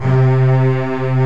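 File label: arcato string; OMI - Universe of Sounds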